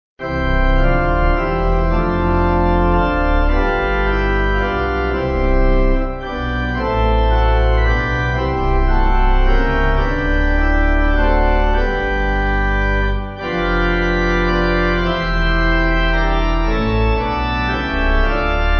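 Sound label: Pipe, Pipe Organ; Almighty Father Who; Vermont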